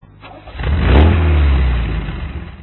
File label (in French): Démarrage de la MGB Weber; numérisation 16 bit